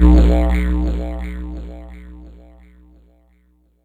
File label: Didger, Yamaha DTXpress; Effect